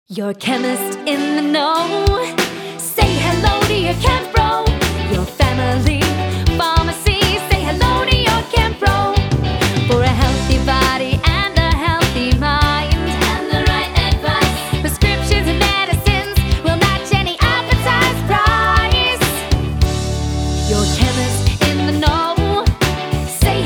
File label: jingle; Chempro